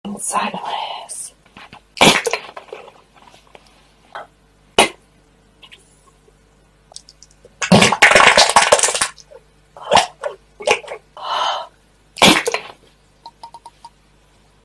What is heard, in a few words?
funny meme